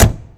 door slam

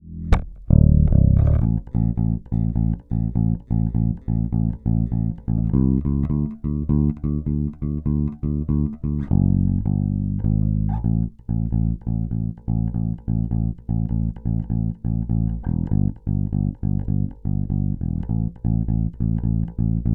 Bass

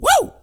MAN whoo

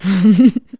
Woman Chuckle; laughs-chuckles

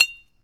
BANG, explosions gunshots

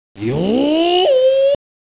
ninja, people Sounds

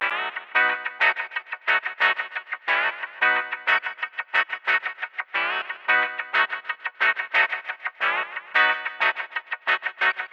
Blues Guitar Rhythm B 90; Blues-loops-no-key-change

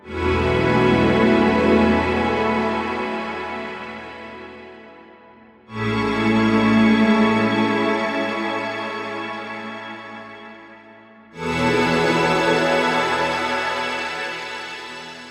Positive Movement stab pad 85

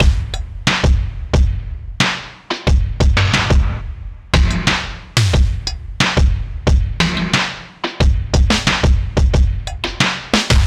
90 Full Drums; Blues-loops-no-key-change; Sanctum

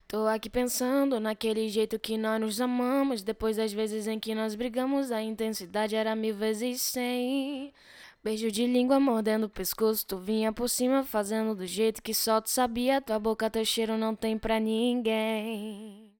Lead Vox

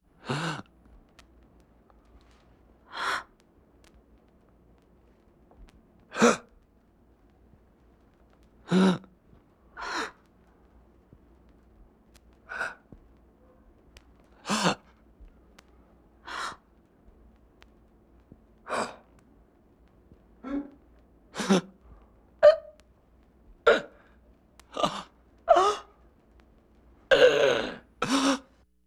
Gasps 17 versions

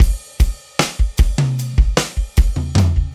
76 Acoustic Drum Fill, Live Trip Hop Drums; Loops; Smoke Signal

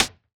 C Hat, Interesting Drums

